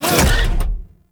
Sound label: Bullet Jump Land, Motorcycle; Warframe; Motorcycle Ninety Nine